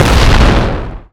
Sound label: rocket fire; weapons; Dystopia; rpg